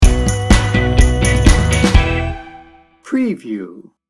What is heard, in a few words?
friendly fun sting bumper